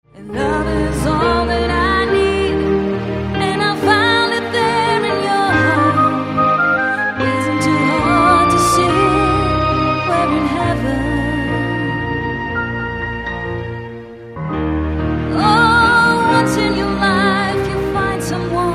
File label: Heaven LIVE